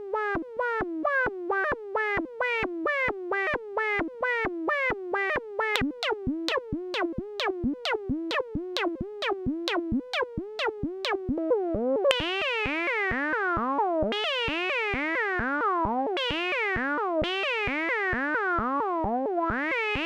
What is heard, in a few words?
noise toaster